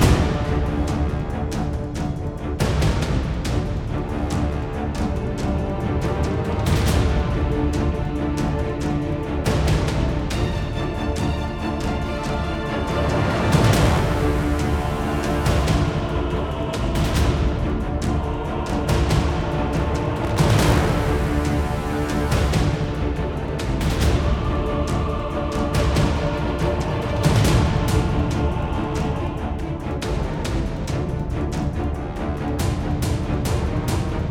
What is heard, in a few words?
Medium Battle Loop